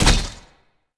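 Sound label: impact sword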